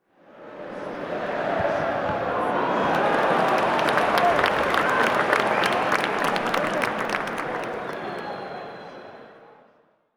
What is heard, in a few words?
crowd